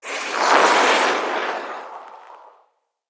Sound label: Siege Proj Whoosh; Level Events; Midrath; Warsong Prologue